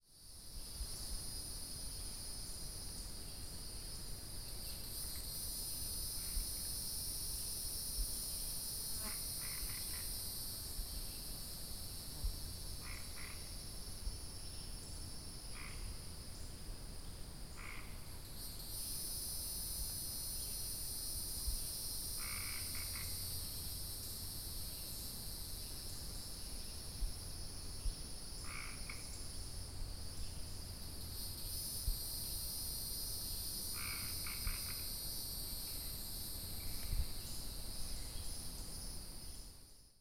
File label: Hieroglyphic Cicada Chorus with Copes Gray Treefrogs; cicadas; ZOOM